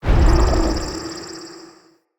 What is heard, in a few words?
Flock Cast; Fey; Ward